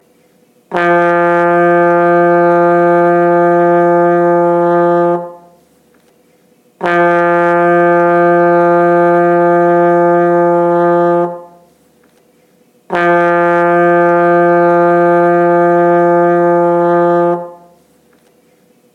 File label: trombone f